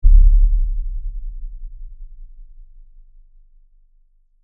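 Impact Low Frequency Effects Monster, Footstep, Tremor; Impact, Lfe, Monster, Footstep, Tremor; Horror; Blastwave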